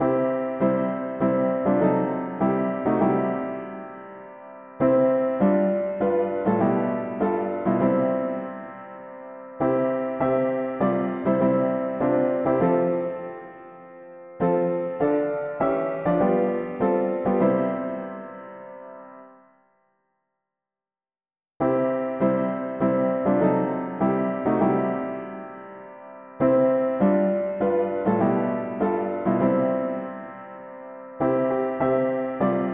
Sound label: mono piano; O Saviour whom absent we love; hymns